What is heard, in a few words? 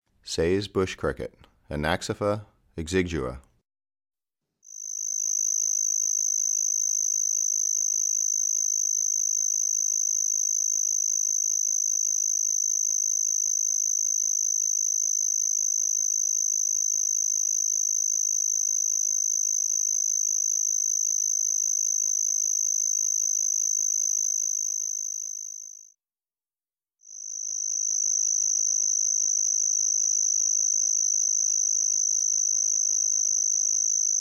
Say's Bush Cricket, Anaxipha exigua; Crickets and Katydids